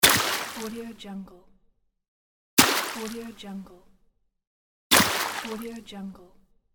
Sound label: puddle; افتادن جسم در گودال آب